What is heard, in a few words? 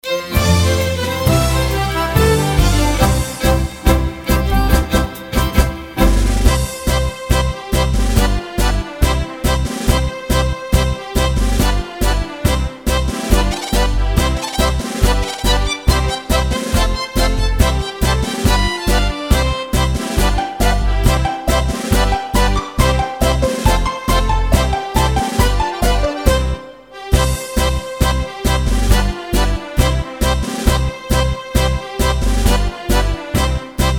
TANGO; BULGARIAN